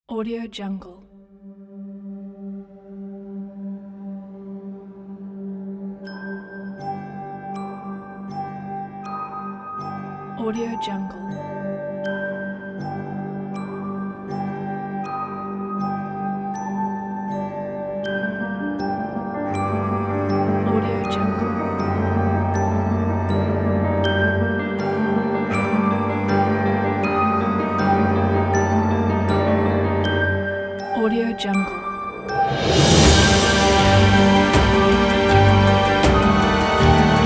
dramatic epic cinematic action trailer

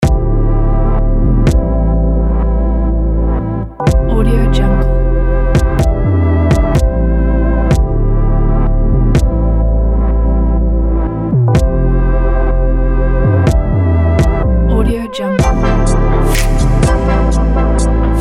abstract fashion

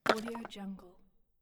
small wooden clutter drop; stormwaveaudio

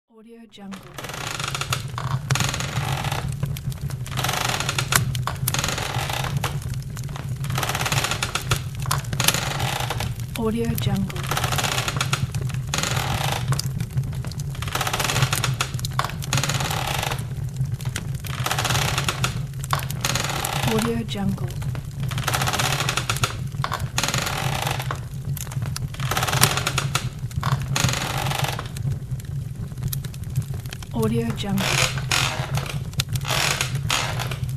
rocking chair by the fireplace

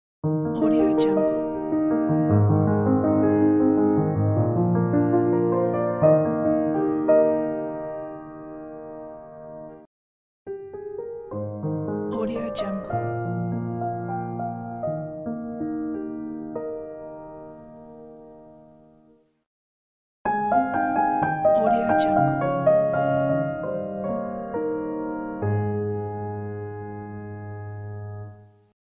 lovely piano logo pack; soundrose

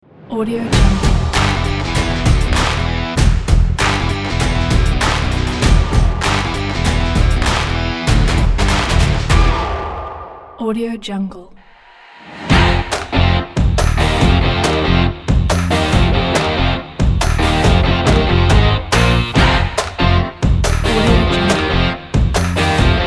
rock intro logo pack

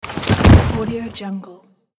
soldier dead body fall

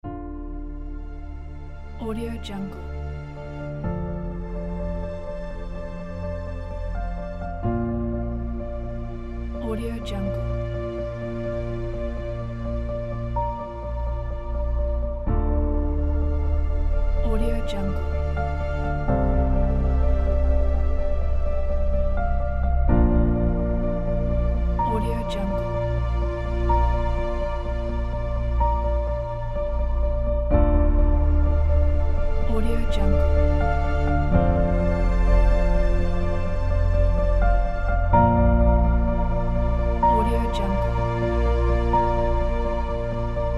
emotional sadness love story

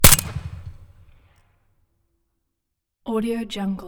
sig552 suppressed single shot open exterior; soundmorph

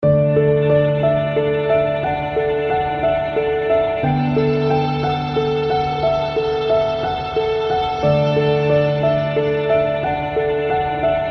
peaceful night piano background

